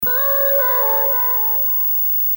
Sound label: Stab; Property Ladder